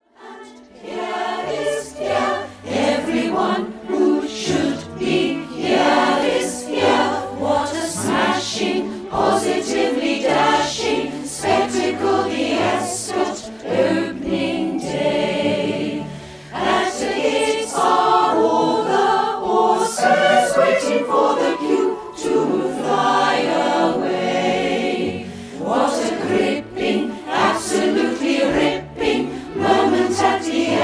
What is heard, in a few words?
gavotte